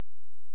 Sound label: SILENCE